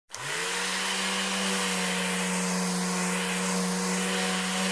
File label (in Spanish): Sonido Lijadora Electrica